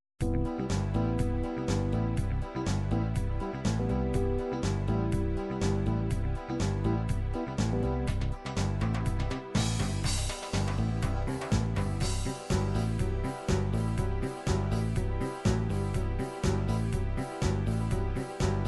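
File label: disco party, midi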